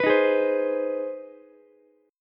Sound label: C Minor Major 7th Sharp 5th chord, Ukulele; strummed; JGuitar